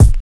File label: Az Kick; Digital Sound Factory